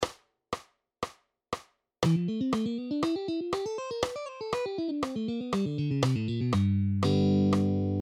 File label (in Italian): Frase Style BPM120, guitar